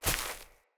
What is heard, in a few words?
Grunt Step Veg, Footsteps; Enemy; Corrupted Sproutfolk